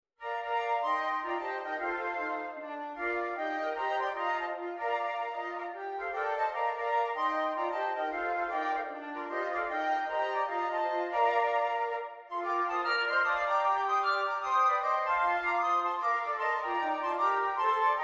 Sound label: flutes, jazz carols for flute quartet; Ding Dong; jazz carols collection set two